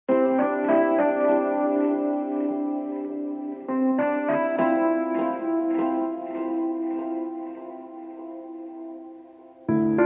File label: Rhodes